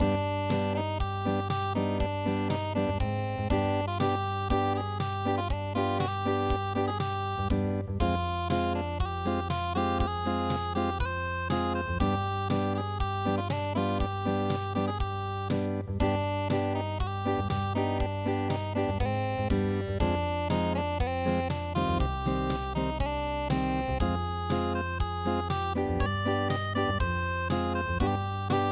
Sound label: unpluged, midi; kuroda